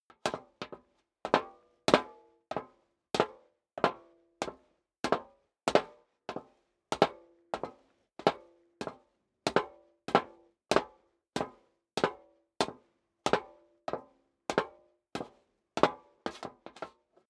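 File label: step high heels metal dry downstairs sequence, footsteps; schritte; menschen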